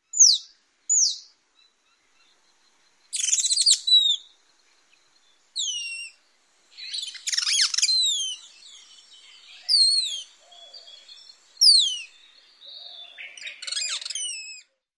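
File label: calls, Rose-throated Becard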